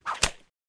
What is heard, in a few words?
whip